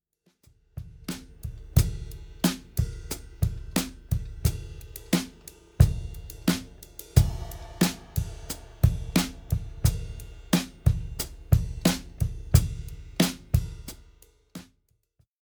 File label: drumbuss with; sonimus britson